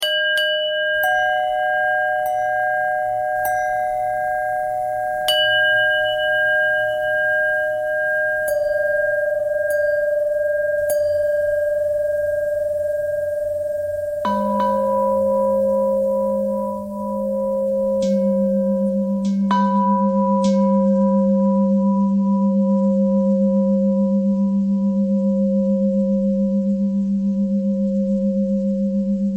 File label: bell threads